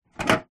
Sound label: plastic file tray drawer open; office